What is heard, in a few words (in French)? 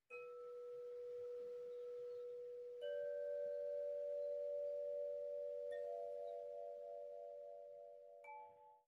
Tubalophone; le nid sonore